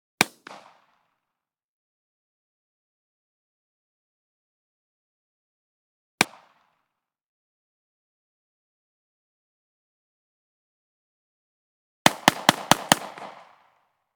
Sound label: .45 ACP Blast Long, Rapid Fire; Hollywood Edge; Heavily Armed